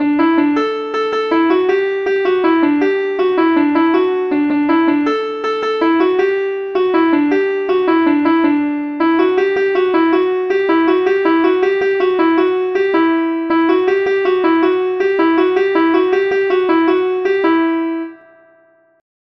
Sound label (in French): derobee guingamp